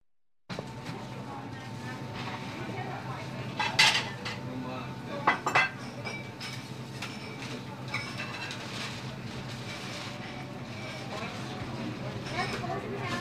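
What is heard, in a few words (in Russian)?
звук небольшого ресторана малолюдно звон посуды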